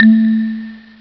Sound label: m1 syn mallet, Amiga Soundtracker Sample Packs; ST-XX Amiga Soundtracker Sample Packs